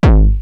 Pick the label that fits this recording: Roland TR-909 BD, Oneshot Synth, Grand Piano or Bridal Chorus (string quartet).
Roland TR-909 BD